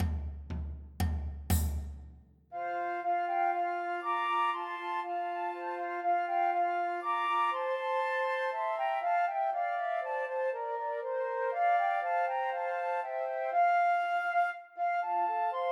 Trios for Three Flutes; The Holly and the Ivy; Christmas

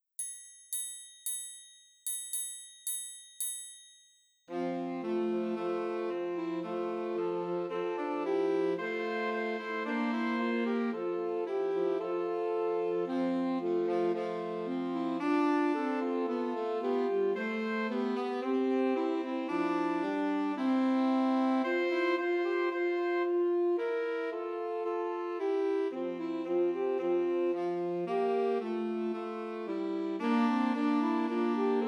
Two Altos and Tenor Saxophone; Christmas Trios

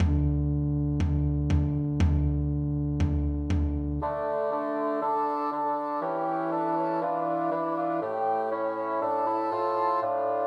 Pat a Pan Christmas Trios for Three Bassoons

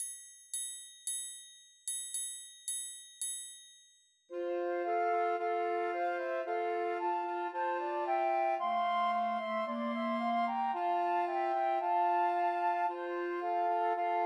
Christmas Trios for Two Flutes and Clarinet; Hark The Herald Angels Sing

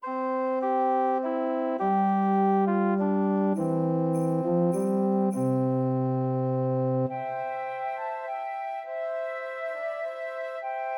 Trios for Three Flutes; Silent Night; Christmas

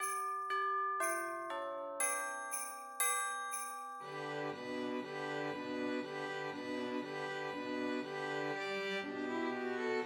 Christmas Trios for Two Violins and Cello; Jingle Bells

